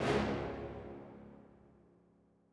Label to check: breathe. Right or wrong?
Wrong